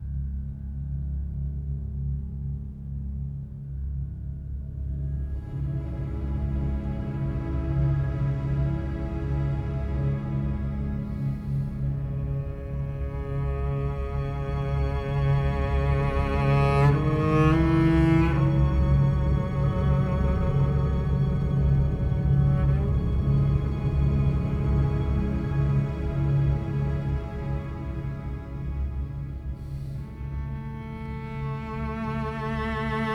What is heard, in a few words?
Default Loading Music